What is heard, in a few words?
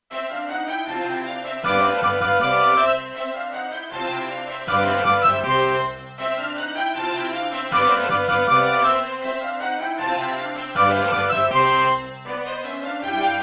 Concerto partial, Gravis UltraSound Extreme; classical; Mozart; Concerto K467 partial